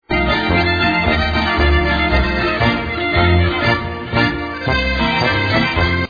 zillertaler

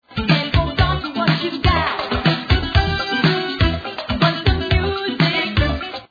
conga

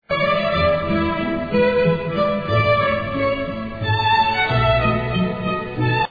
minuet

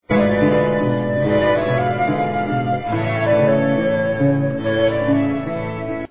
flute

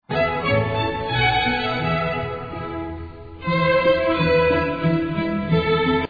minuet

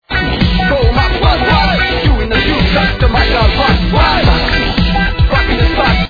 rock

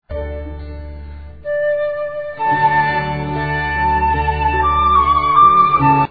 flute

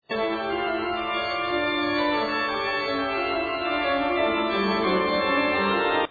fugue d minor

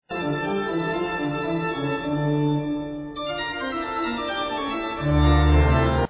fugue d minor

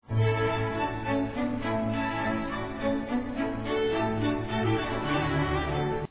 minuet

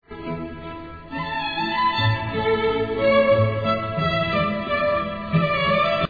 minuet

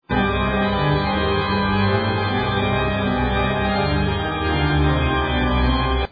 fugue d minor

